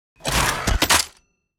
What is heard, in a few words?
Proton Pack Cocking; Ghostbusters 2016